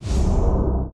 Sound Special Pattern Mistery

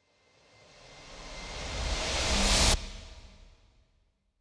Sound Sweep Slow Start

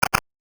Toy Phone Hang Up

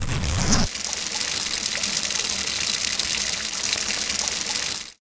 Wc Piss

